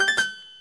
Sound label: Retry Jingle; Friday Night Funkin' Every Copy of Mario 64 is Personalized